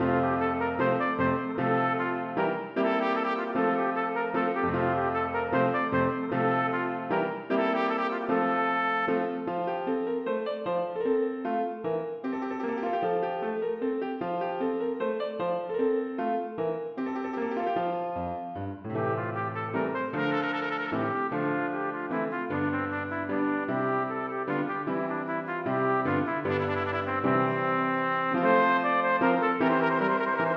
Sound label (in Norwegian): Menuet for trompet og piano; Aamodt